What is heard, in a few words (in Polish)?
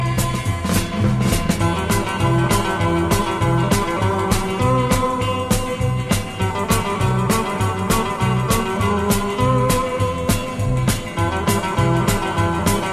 gitara